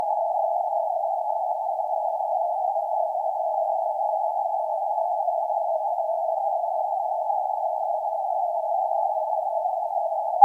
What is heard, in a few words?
30m CW